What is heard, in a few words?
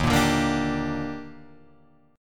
Eb Major 7th, Guitar Drop D